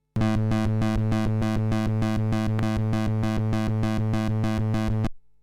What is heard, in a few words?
Moog steel nickel vintage; Warm Audio TB12